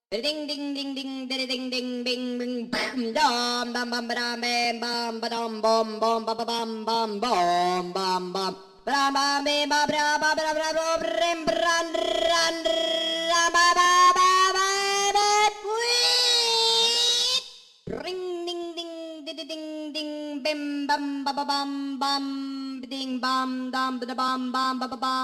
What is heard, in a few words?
original sounds transposed; the annoying thing sound